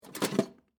Lunch Box Pick Up, Foley, Lunch, Box, Pick, Up, Metal, Case; Pick Ups; Objects; BlastWave